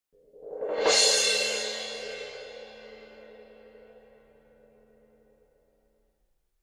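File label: suspended cymbal roll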